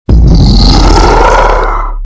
Jumpscare; poppf drumseratops cursed

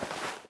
item sandwich drop

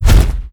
para close; Garry's Mod; tf2

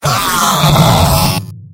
engineer pain critical death; tf2; mvm

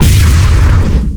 explosion charge; weapons; cow mangler; tf2

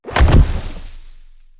step; bron pack

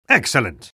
spy cheers; vo

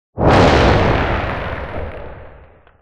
explosion; brainrot; bombombini gusini

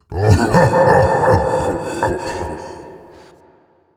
chuckle; nihilanth

